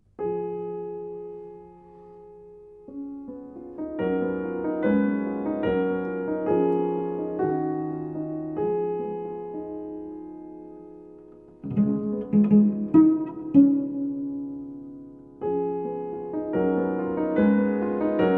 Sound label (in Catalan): Piano i Cello; Janacek